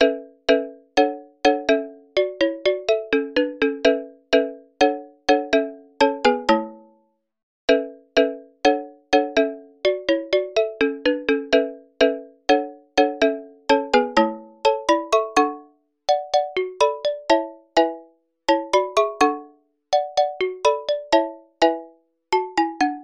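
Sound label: incoming call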